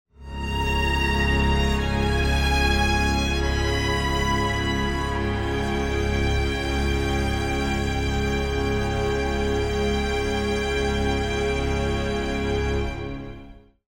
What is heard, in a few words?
lost round; Outlast